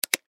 Button Switch

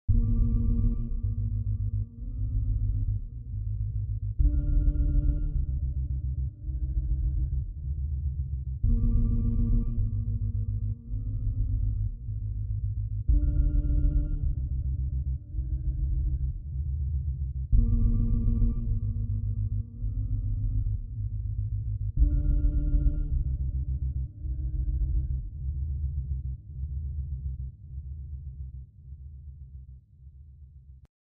Subscend, Meditative loop.; Scary Soundscapes; Horror

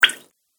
Water Button, button sound effects; multimedia

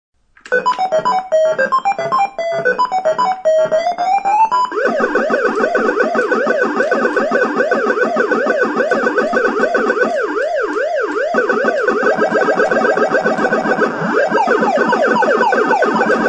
video game; Playing PacMan video game; Video Games (Sound Effects:Games)

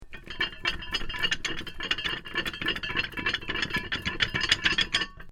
Heavy Chain Rattling On Winch Short; Chain sounds; Metal Sound Effects; LYN104 package of Chain sounds

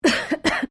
Female cough medium; Female vocal sounds; Clearing Throat; Human

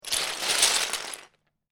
Lego, grab several lego pieces; Miscellaneous Toy Sound Effects; LynneMusic

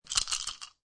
Dice Shake Dice In Hand; Casinos

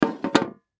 Candy Tin Small, Candy Tin Set Down Table; Objects; Foley; Blastwave

